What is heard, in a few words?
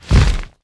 step; balathron; svencoop